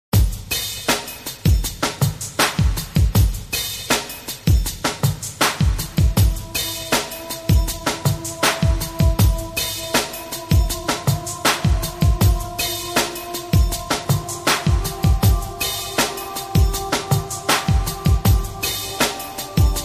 north country fantasy

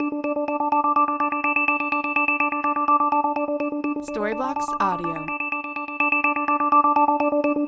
Ambient House Arp 125BPM F

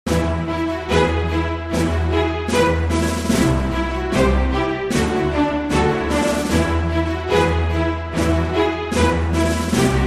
national spirit

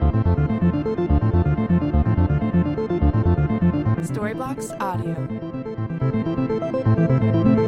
Ambient House Melody Arp 125BPM D

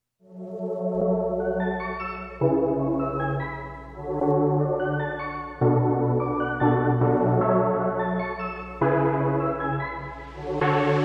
forest serious future bass track; audioblocks

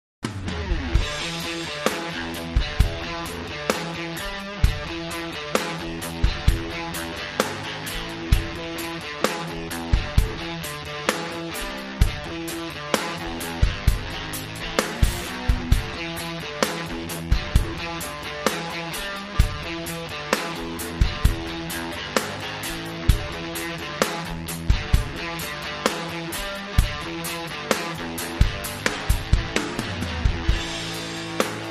smoky gtr drums

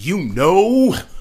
Rap Vox

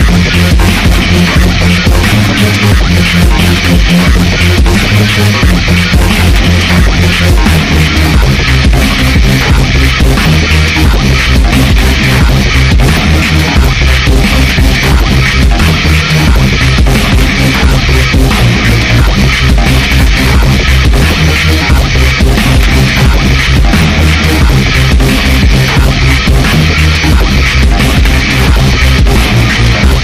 Modern Digital Dubstep Mix; bbc

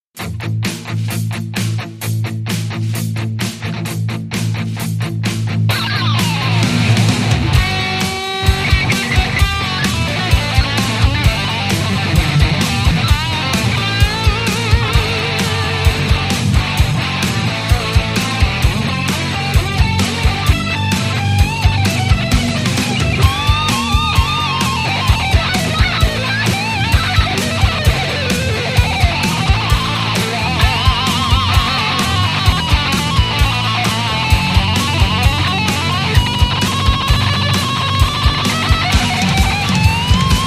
rock

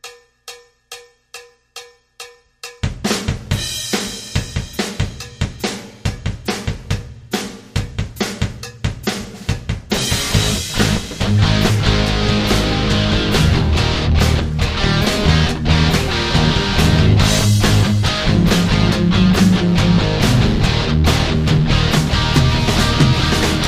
red neck rock

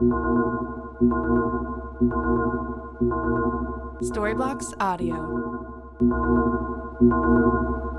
Ambient House Arp Pad 120BPM C

